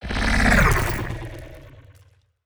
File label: pro ship strikecraft move; biogenesis